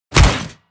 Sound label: Punch Full Impact Metal Ring Out